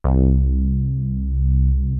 SYN BASS; ZERO-G; Datafile

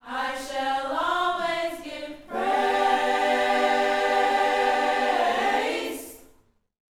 Vocal Gospel PHRASE

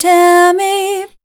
voice spectral, ly short

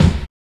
BD; E-Lab; X Static Goldmine 1

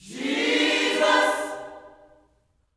JESUS CHD, Vocal Gospel

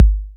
BD

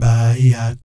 BAYEEAT, Vocal Planet Jazz Fx; Spectrasonics

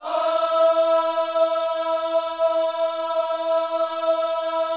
OHS E 4, gospel; Spectrasonics; Vocal Planet Vol.1 Gospel; akai

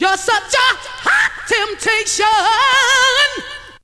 VOC

